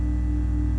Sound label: bg noise